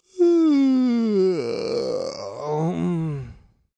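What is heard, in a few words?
Yawn Drawn Out male, Yawn, male, drawn out; Yawning Sound Effects; (human voice)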